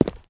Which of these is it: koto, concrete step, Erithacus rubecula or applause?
concrete step